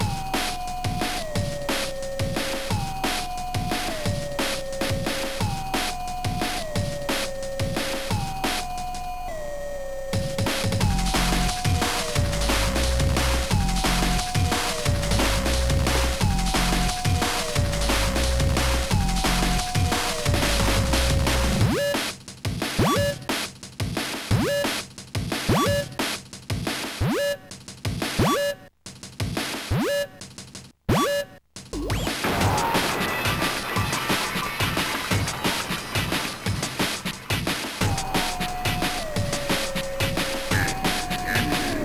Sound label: short drum; DIGI Booster (DBM)